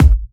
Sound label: Hard Kick; Vengeance Samples